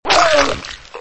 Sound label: mad murder knife death; Memes